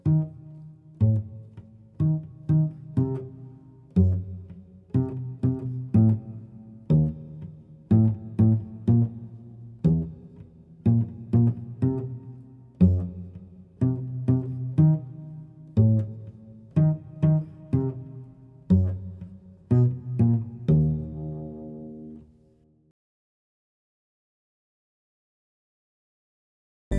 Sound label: Hocketing